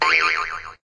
boing long

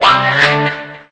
alert

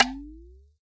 drummy boing up

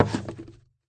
falling cucumbers

